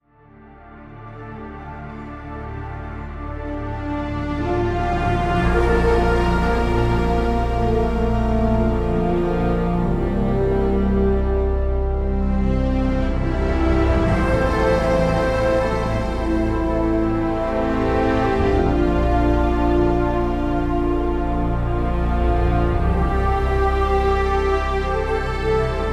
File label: Majestic Movement Opener Less Dynamic Horn